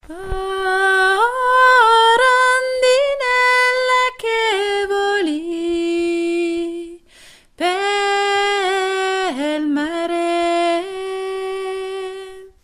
rondinella voix aigue ou grave

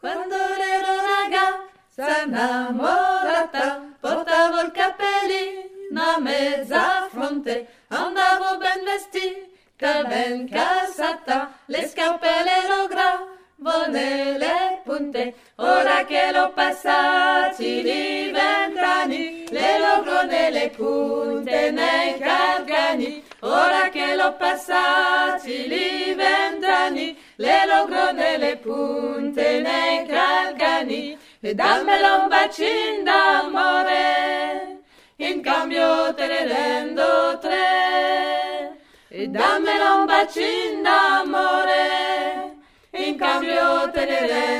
voix 1 et 2

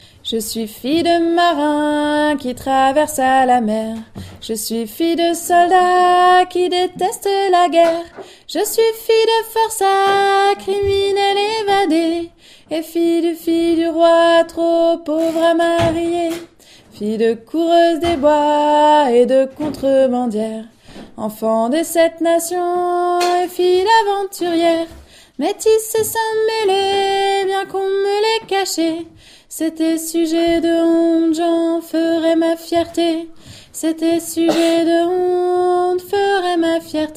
fille de voix aigue

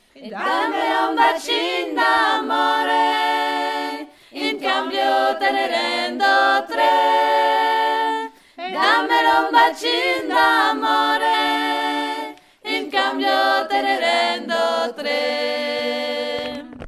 pan pentito voix refrain seulement

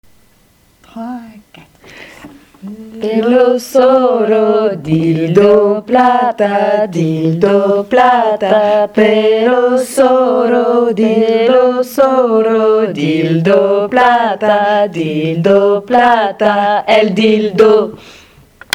los peces voix basse couplet avec paroles

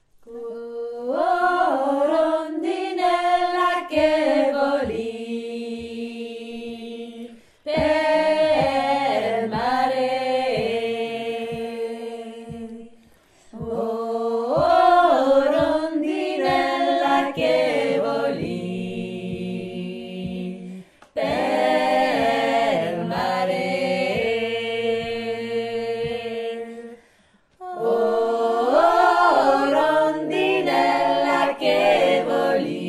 4 voix

